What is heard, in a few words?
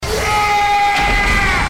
aaaaaaaaaah; deus da luz